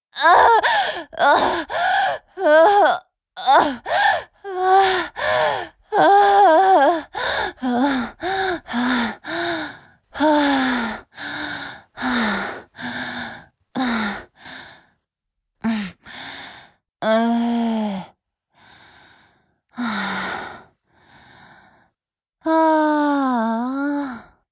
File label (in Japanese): 絶頂後の息遣い